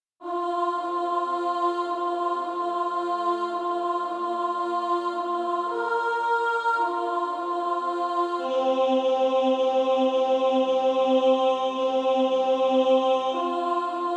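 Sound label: Female Choir, alto 1; Sweet Hour of Prayer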